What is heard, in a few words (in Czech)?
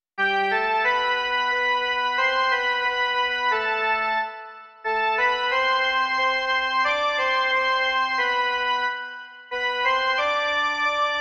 jen melodie; Sotva země v jitra zdobě